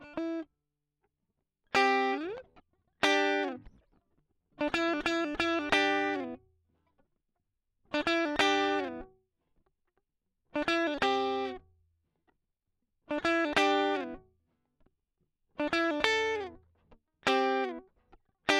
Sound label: Guit Solo Line